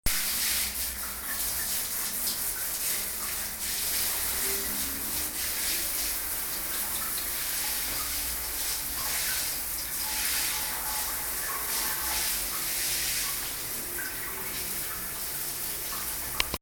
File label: shower